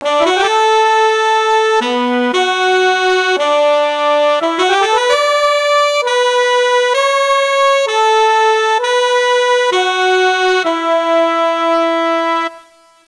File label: Sustained Brass Section; SampleTank 2XL